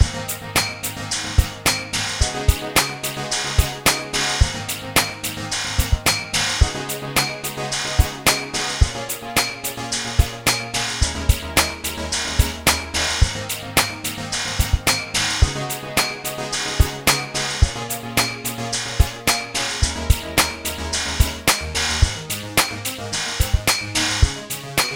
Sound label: Soda Pop Factory, funny